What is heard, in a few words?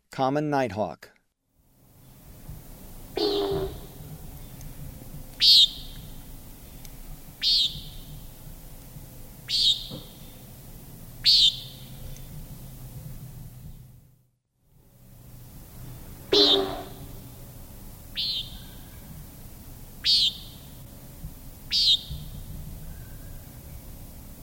Common Nighthawk; S. America; Eastern Lowlands